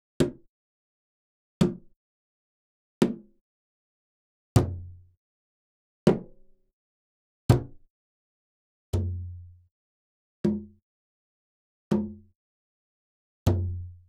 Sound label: Gamelan; Propeller Island - Complete Gamelan